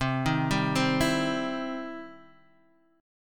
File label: E Minor Major 7th Sharp 5th, C